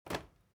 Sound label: foley step crowd march quiet single left; smartsoundfx